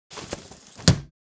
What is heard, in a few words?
Small umbrella open